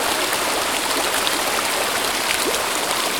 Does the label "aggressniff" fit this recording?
No